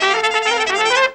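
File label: clarinet trumpet; Best Service; World Colours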